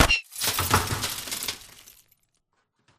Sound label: Drone Death Explo Close; Titanfall 2